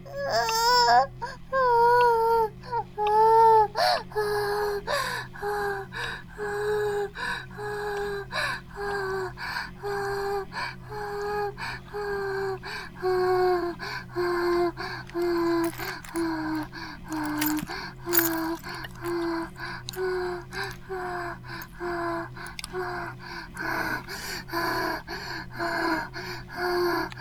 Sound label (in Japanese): バイブ放置; イクたびにわけて